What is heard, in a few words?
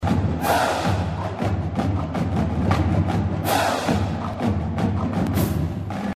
cadence